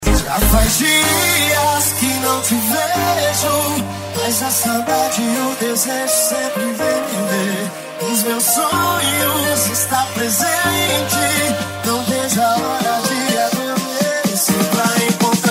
dance e funk retro; branco som

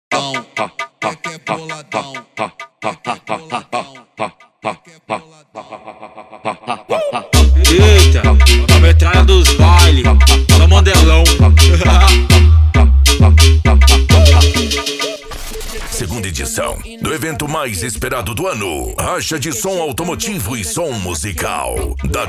racha de som automotivo e som musical

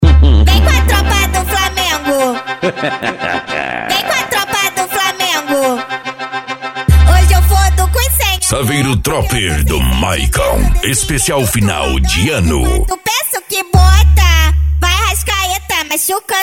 funk agressivo funk

